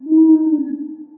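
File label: Owl Hoot E flat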